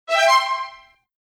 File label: strings